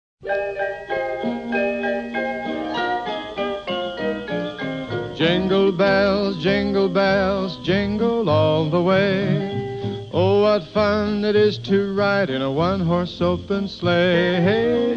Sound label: Jingle Bells, carillon; Kerst